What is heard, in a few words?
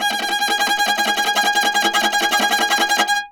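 viola tremolo G#3; Orchestral; DirectWave; Varazdin Orchestral; Viola II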